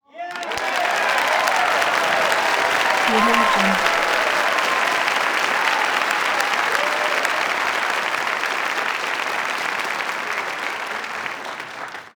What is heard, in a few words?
crowd applause cheers interior audience pause